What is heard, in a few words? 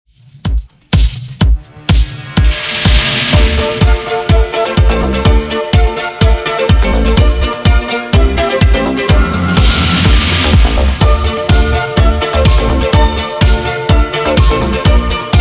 80s Redrum